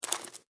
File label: Insect Dig Dirt, Insect, Dig, Dirt, Bug, Movement; Animals:Insects; Miscellaneous Insect; Blastwave